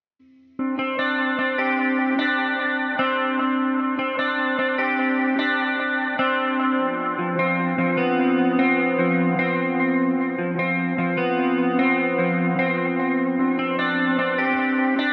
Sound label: 150 BPM C Maj Top Guitar; Loop Stems; Cymatics; Punks